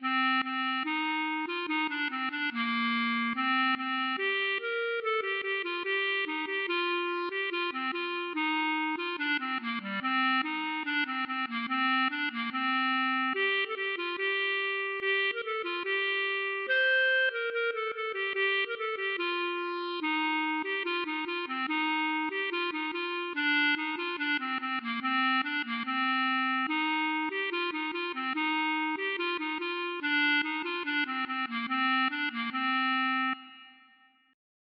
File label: klezmer